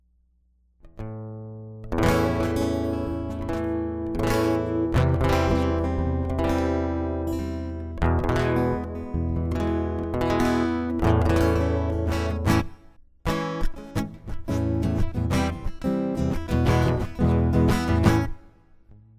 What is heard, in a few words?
guitar prelude